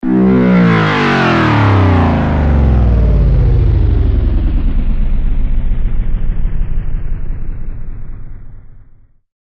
PwDn Pull The Plug

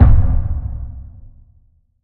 Not Simple Low Hit

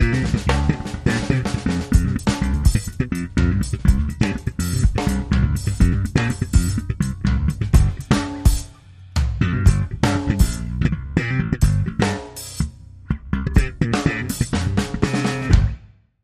Bass Virtuoso

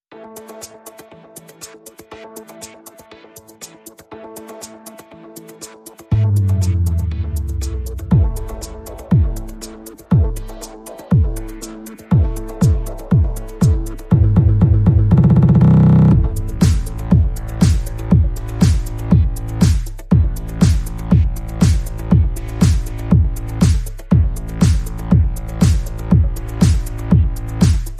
No Vocals

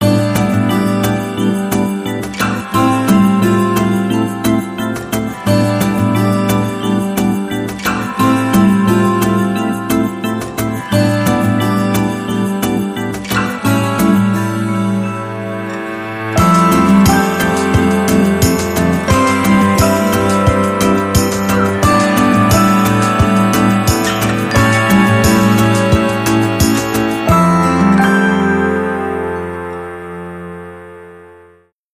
Acoustic Heart

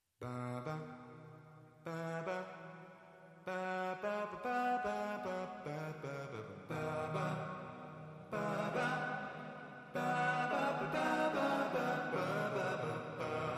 Choirs

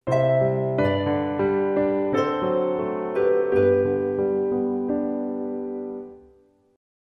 Come All Ye Faithful, Sting

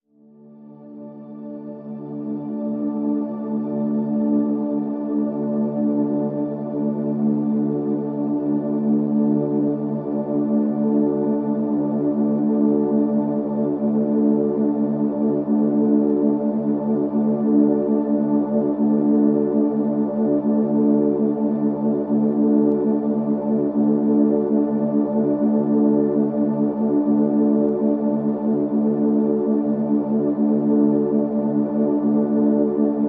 Pads (D)

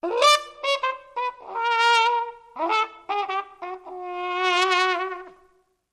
Trumpet Comical Bridge

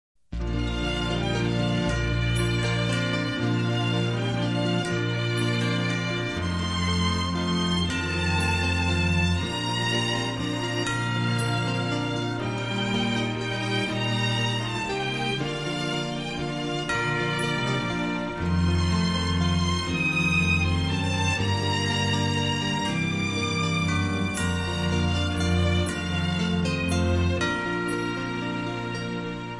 Silent Night, Brass Band and Small Group

